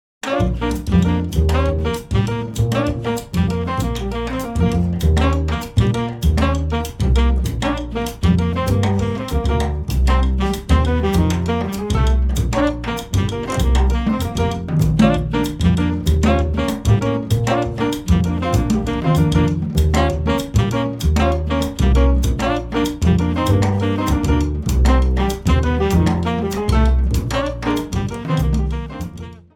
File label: tumbao